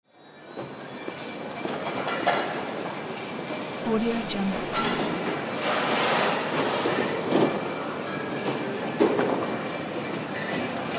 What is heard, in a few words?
supermarket; pure-noise